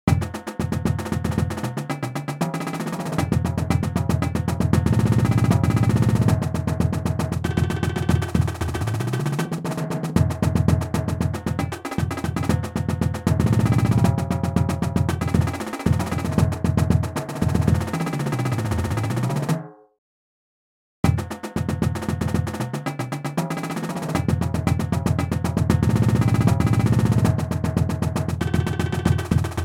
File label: triplet rolls